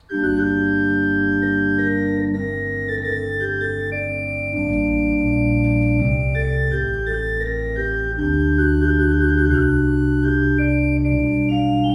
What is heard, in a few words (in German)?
Walcker, gebrauchtorgel